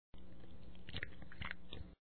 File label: Suck; Lewd SFX